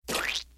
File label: juicy; soundspvz